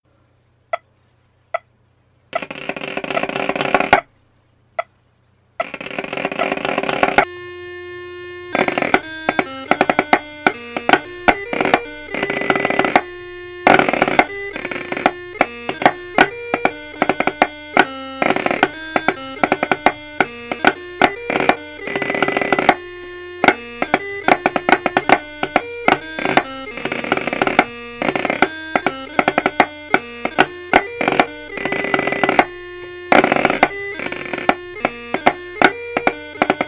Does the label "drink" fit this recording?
No